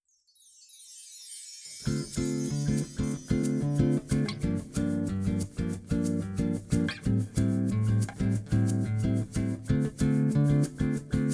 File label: flamenco vibes, 432 hz